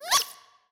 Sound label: jump giggle; loki